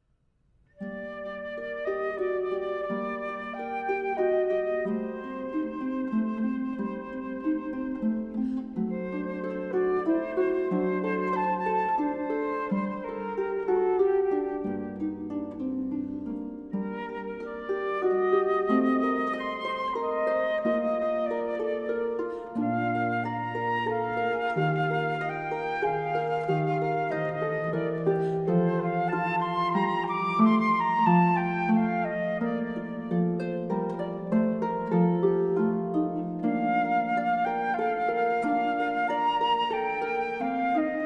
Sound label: Siciliano with Flute, Harp